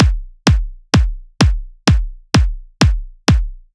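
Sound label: Drum Loop 128BPM bass drum; VEC5 Drumloops; VEC5 Multi Loops; Loop Elements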